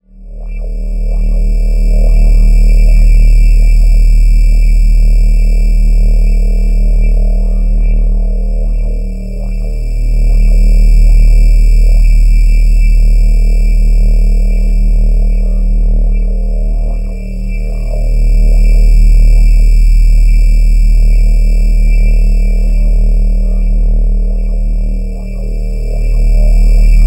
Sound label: ambience energy drone; sonniss gdc